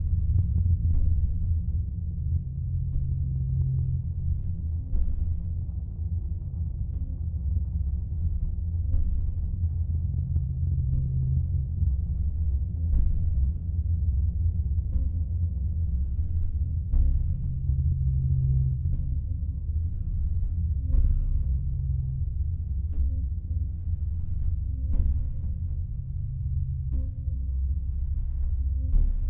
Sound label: Ambience; Wick